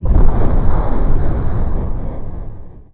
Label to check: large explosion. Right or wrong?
Right